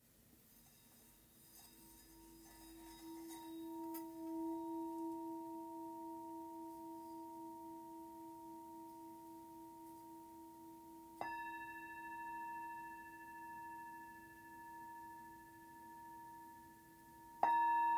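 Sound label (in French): Bol grave mi tara 595g, Bol chantant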